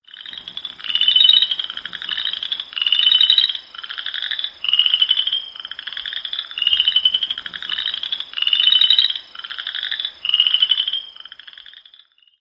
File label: Southern Chorus Frog; herps of NC; anurans